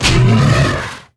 hive wound

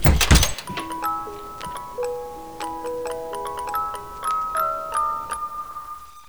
mbox open; nzp

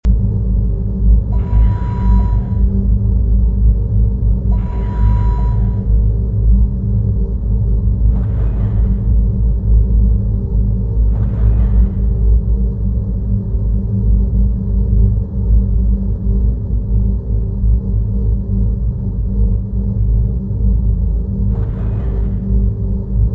control room, ambience

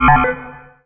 launch glow

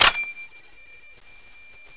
clip out, weapons, garand; nzp

